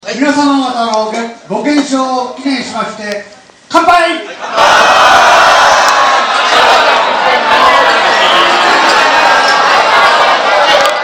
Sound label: cheers